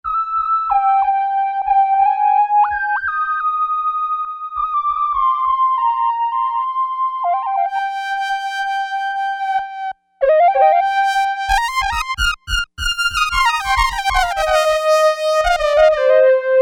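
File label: soft square lead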